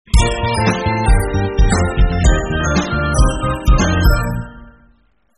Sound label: Won Restaurant Jingle; Delicious Emilys True Love